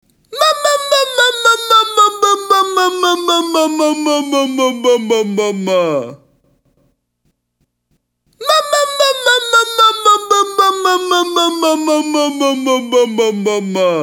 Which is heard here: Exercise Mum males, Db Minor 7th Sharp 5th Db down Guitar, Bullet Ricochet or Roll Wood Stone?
Exercise Mum males